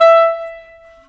Clarinet, Cinematic; Sonic Foundry (Sony Creative Software); Orchestral Series VOL-3

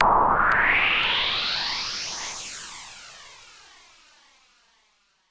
noise fx; Masterbits; Switch FX

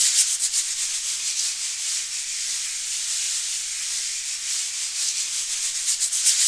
Af Shaker FX; Roland LCDP14 Africa VOL-2; Perc FX

